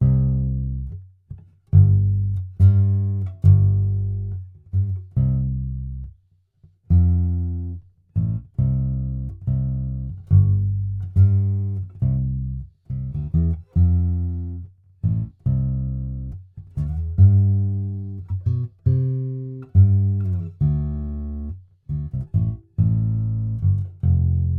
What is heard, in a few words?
solo